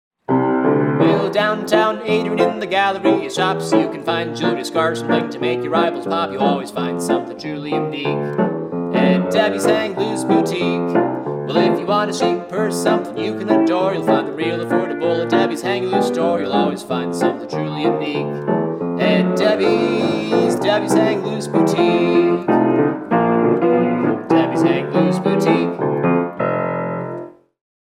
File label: Boutique Jingle; Hang Loose